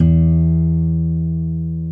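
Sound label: E1 classical guitar; InVision Interactive; Lightware VOL-1; Instruments & Percussions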